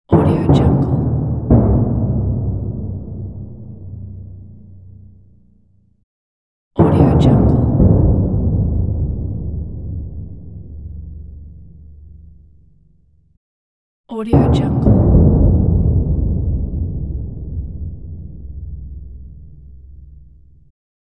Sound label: battle drums